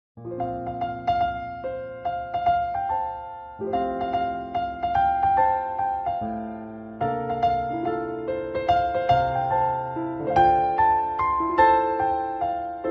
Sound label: piano ver.